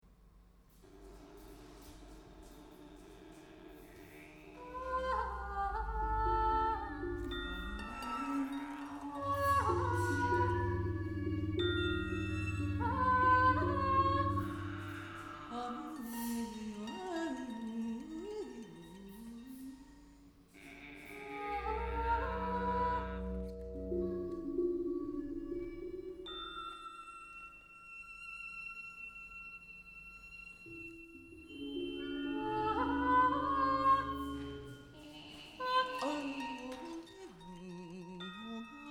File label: Landscape Stereo Antiphonal Duos